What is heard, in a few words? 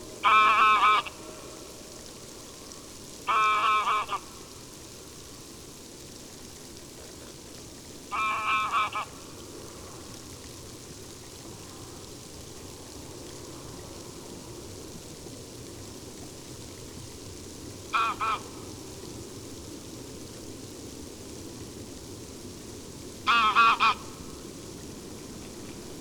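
greylag goose, flight call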